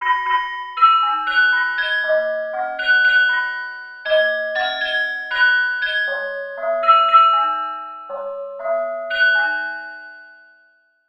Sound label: waltz